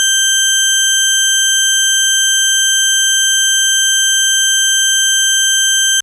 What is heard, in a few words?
FM Sawtooth DX100 G6